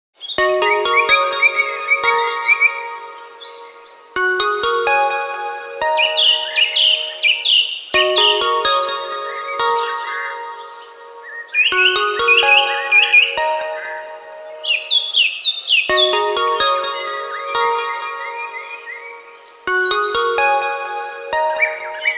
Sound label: Soothing Alarm Tones